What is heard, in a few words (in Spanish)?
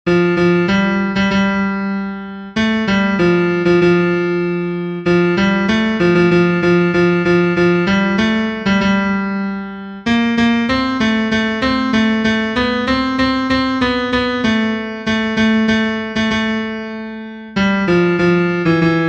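TENOR, himnos en piano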